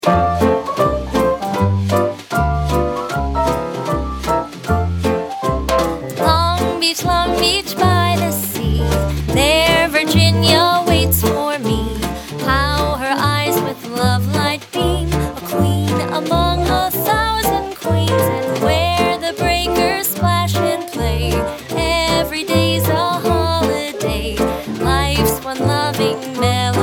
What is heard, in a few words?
Sing A Long Melody